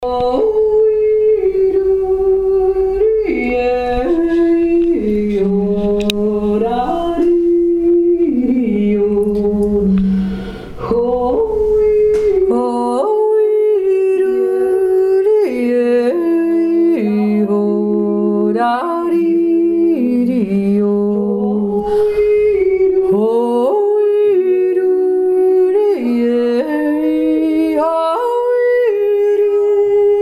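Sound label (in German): kapellner gläut kanon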